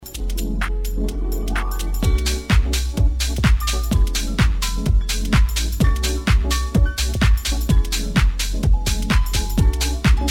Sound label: Melody, RingTune